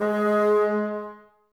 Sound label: BNS G#3, Bassoons, Wood Winds; Miroslav Vitous - Woodwind Brass Ensemble; Bassoons Links